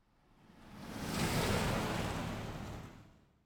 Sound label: Automobiles various